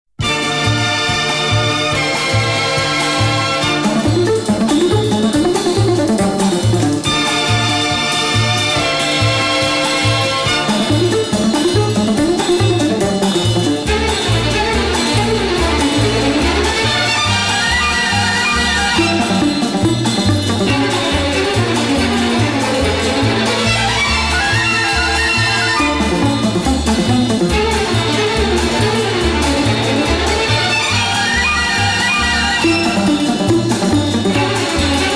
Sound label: orchestra, samba